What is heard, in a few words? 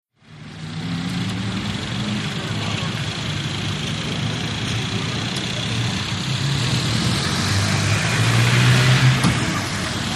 Wet Bsy Street Car Pas